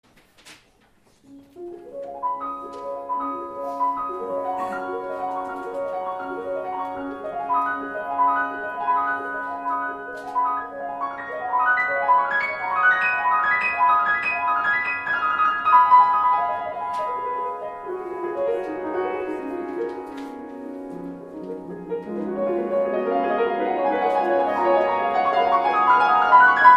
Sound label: piano; Liszt